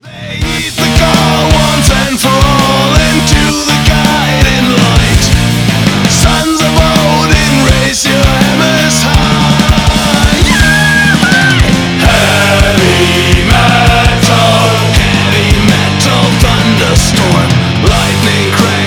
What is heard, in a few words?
Heavy Metal Thunderstorm